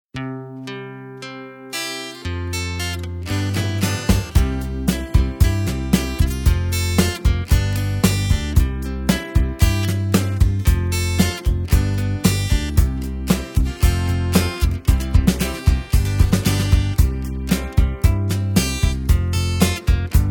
(C) No Backing Vocals

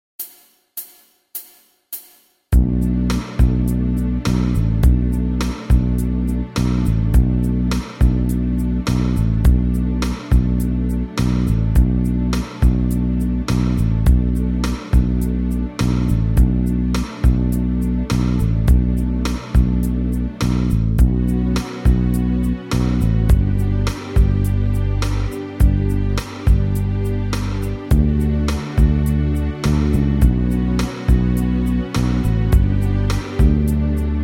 D No Guitar, backing tracks

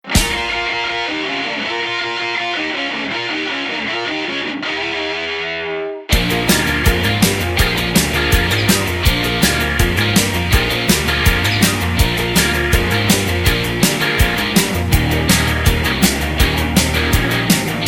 Medley, No Backing Vocals

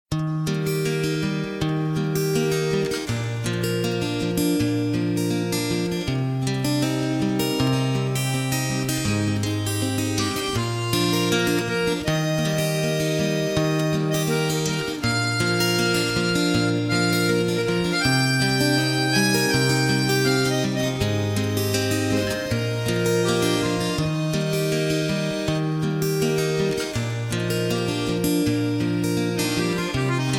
Eb No Backing Vocals, backing tracks

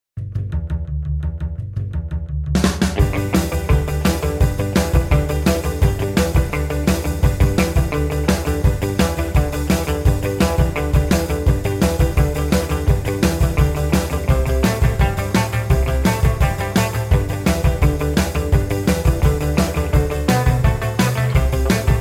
G No Backing Vocals, backing tracks

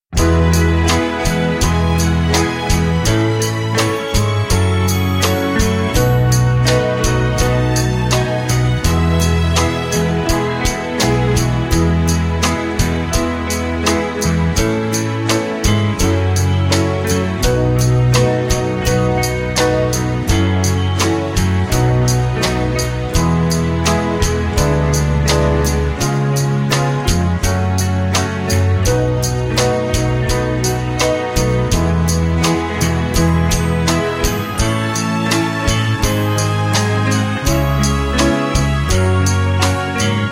(E) No Backing Vocals

